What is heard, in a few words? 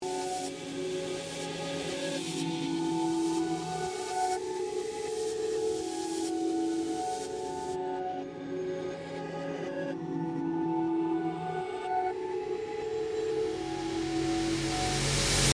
reverb reverse